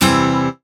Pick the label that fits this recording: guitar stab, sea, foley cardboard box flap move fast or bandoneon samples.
guitar stab